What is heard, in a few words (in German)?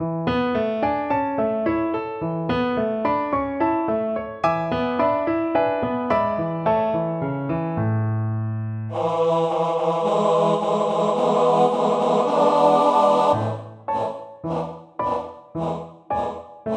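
Männerchor vierstimmig Klavier, plattdeutsch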